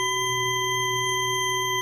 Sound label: tape tone; Misc Percussion